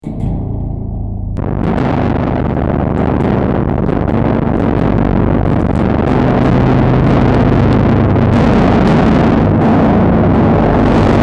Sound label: metaljunk